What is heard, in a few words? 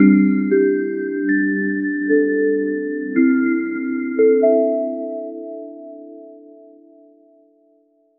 minimalist apple style, corporate